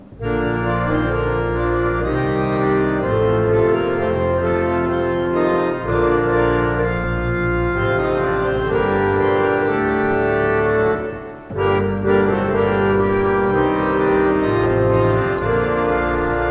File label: Mannborg